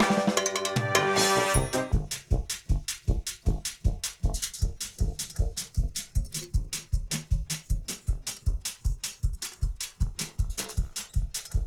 Intro Drum

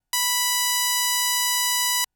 Pulse B B3; fleet keys Samples

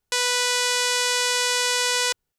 Pulse B B3; fleet keys Samples

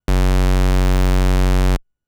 Square F#0, keys; fleet keys Samples

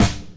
Snare

